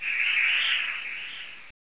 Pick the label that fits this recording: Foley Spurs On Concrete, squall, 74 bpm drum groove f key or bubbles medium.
squall